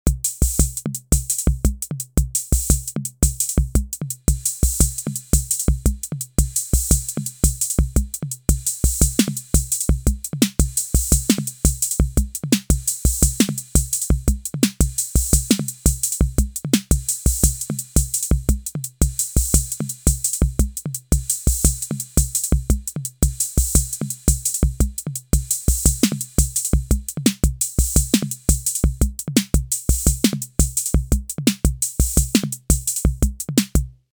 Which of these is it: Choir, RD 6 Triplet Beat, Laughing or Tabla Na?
RD 6 Triplet Beat